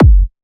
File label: Kick, Techno, One-Shots, Drums